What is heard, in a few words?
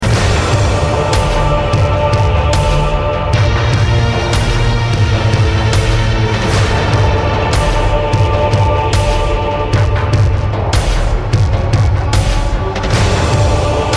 love music; cstrike; xue zm